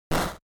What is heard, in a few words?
Noise; GB General